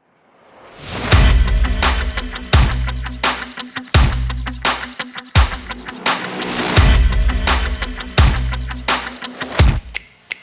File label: Trailer Music